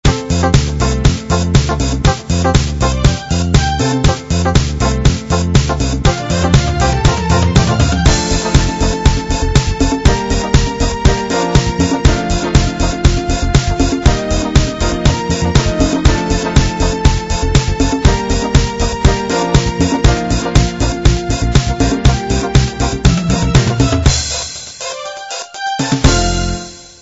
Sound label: 70s disco